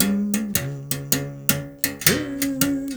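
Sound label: BS BERIM GRV, BERIMBAU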